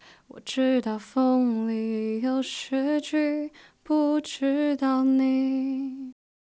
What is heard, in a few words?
Alto